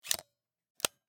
Camera, Pentax KS2, DSLR, mechanical, flash up, close, XY; Eiravaein Works; Vaeyan IV